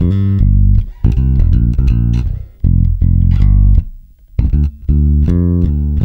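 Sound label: shuffle G, bass; Spectrasonics; Bass Legends; SHUFFIT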